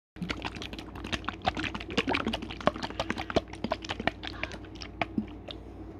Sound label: gurgle drink sounds